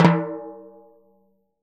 TIMB HI, Synclavier Percussion, World & Orchestral; ILIO; Synclavier Percussion 2